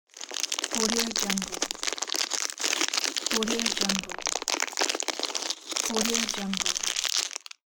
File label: plastic bag wrapping